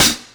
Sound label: Closed Hi-Hat; Drum n Bass; Hi-Hat One-Shots; KJ Sawka; Drum n Bass & Beyond; Drum Tool Box